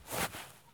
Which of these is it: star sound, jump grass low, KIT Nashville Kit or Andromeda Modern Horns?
jump grass low